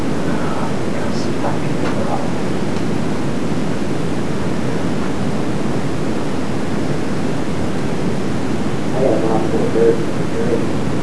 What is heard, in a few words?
tunnel; BradyTheater; evp